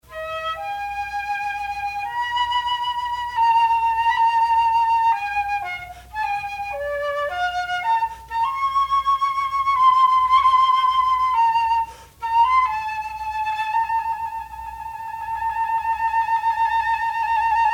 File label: flute piano excerpt, chamber music with piano; This evocative work was inspired by the writings of Carlos Castaneda